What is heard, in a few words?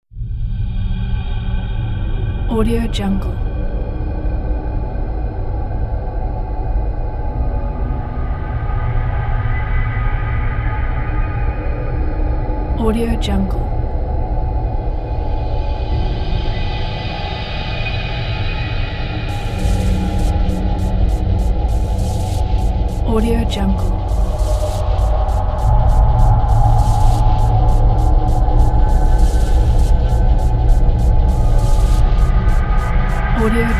suspenseful tense